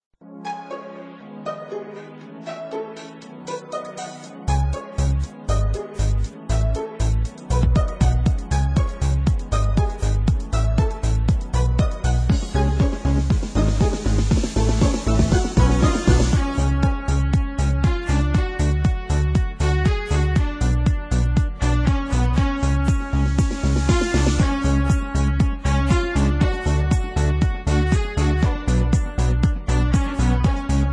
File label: techno